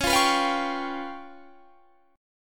C sharp 7th Flat 5th, Guitar, Standard; C#7b5; strummed; JGuitar

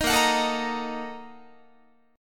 Bb-Major 7th Suspended 4th Sharp 5th, Guitar; strummed

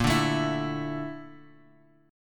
Bb Major Sharp 11th, Bass Standard; 18,17,19,21; strummed

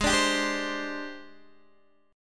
Ab Major 7th Flat 5th Ab, down, Guitar, Open D

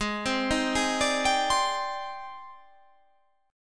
G sharp Major Sharp 11th, chord

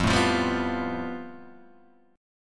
A 7th Suspended 2nd Sharp 5th F, chord

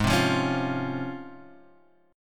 C sharp Major 7th

